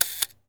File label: Camera